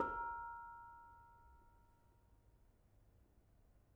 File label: Bonang Barung, gamelan